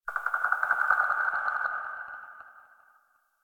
Tree Critters Scared; Ambience; Props; Warframe; Duviri

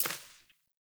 Sparrow Step, Footsteps; Animals

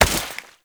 Step Water

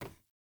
Ladder Climb Wood; Player

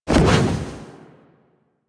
Wall Latch Start; Warframe; Tenno

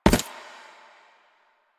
Butcher Step Default, Footsteps; Enemies; Soldiers; Foley; Warframe; Lasrian

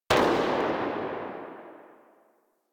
Tail, Weapons; Warframe; Tenno; Miter

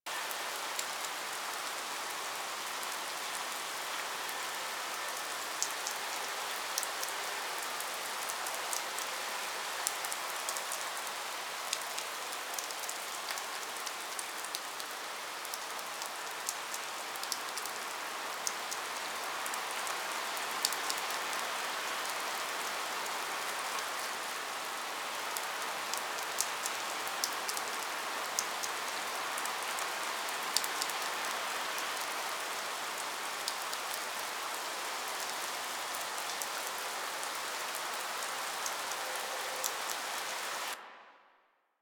Rising Blades Range Loop; Warframe; Warframes; Pax Duviricus

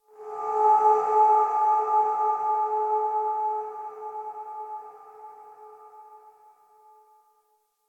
Singing Bowl; Warframe; Ambience; Clan Dojo; Dojo Randoms

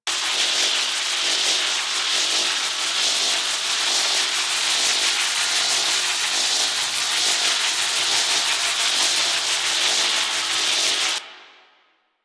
Ship Shield Beam Loop; Warframe; Corpus Ship

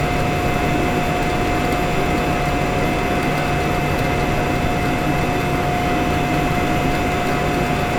Elevator Loop; Venus Kahl; Warframe; Quests; Veilbreaker

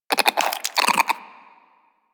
Vocal Idle, Hunting Critters; Warframe; Cryptilex

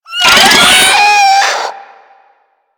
Infested Biped Death; Warframe; Moa

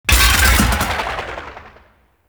Necramech Melee Death Fall; Enemies; Warframe

